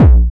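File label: hard bass drums; Bazzdrumz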